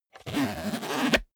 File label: zipper small case close